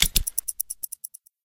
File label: Stopwatch Appear; Angry Birds